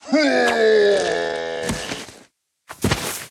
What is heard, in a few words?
Slaver Death